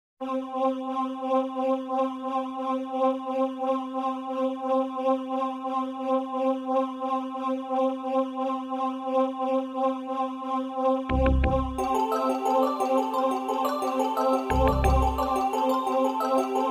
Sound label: Jingles; 90 DDD Jingles